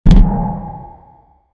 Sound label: entering a room while bei, architecture